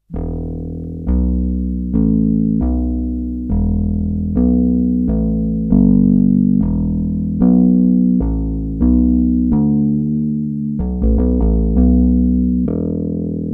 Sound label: Bass guitar